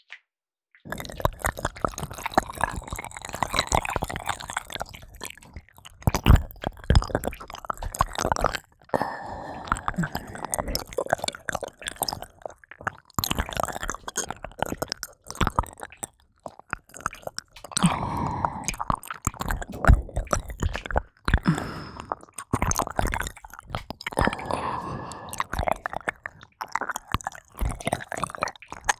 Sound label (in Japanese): 耳舐めマシマシ; 一人に犯され